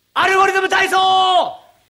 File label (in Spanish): Grito Japo